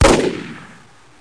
Rifle; Close Combat; CC Sounds

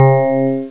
707 wave piano

